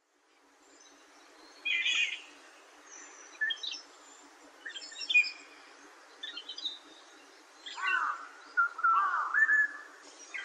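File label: black-naped oriole, tokyo; koe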